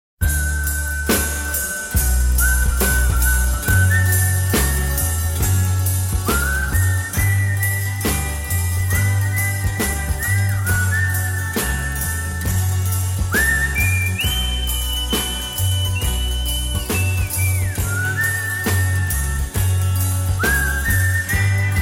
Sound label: Whistle Guitar